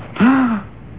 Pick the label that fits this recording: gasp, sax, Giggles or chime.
gasp